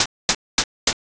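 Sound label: footsteps poolside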